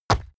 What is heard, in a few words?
horse step stone